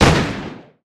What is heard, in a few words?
shotgun fire; Sourceforts2.0; weapons